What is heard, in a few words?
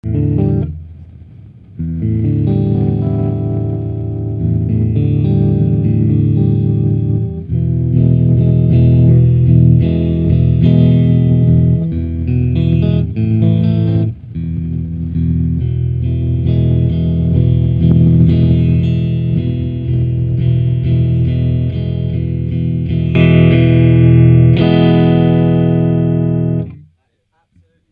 broken diezel vh4; channel problems